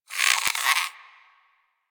Rodent Vocal Snore; Warframe; Hunting Critters

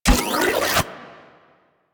Charge Up B; Warframe; Weapons; Corpus; Vandal

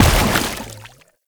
Step Water, Footsteps

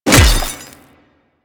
Melee Hammer Impact Robotic Flesh

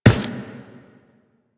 Run Glass, Footsteps; Warframe; Tenno

